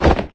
Jump; MapleShrine; mkxp; gameasync

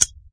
Switch; MapleShrine; mkxp; gameasync

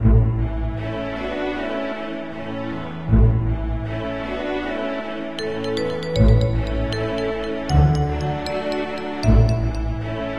slow; MapleShrine

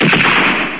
rifle; MapleShrine; mkxp; gameasync

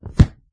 Book; MapleShrine; mkxp; gameasync

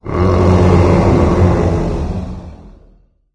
Monster; MapleShrine; mkxp; gameasync

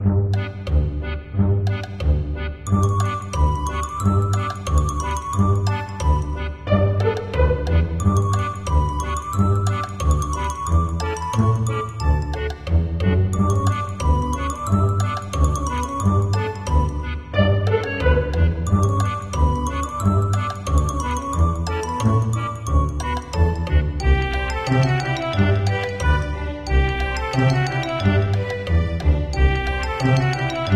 Town; MapleShrine

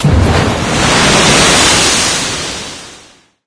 Dive; MapleShrine; mkxp; gameasync

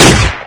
missile; MapleShrine; mkxp; gameasync

